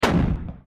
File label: artillery; Mindustry